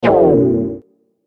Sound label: wrong